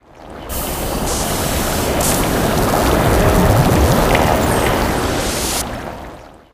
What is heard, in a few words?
chem set creation